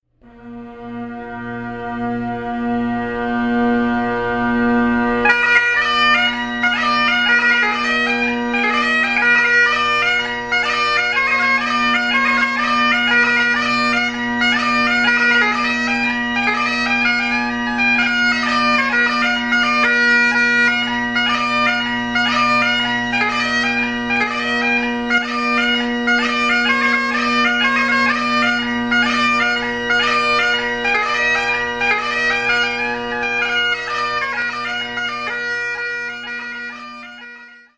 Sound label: Banjo Breakdown Paddy Jig, bagpiper